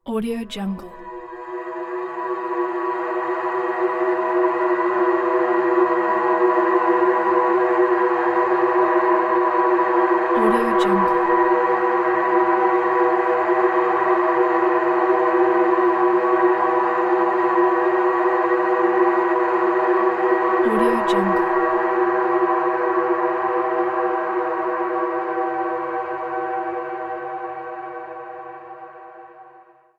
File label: dark atmosphere